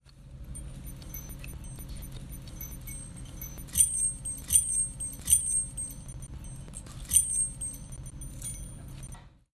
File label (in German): klingeln